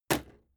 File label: impact sweetener wood medium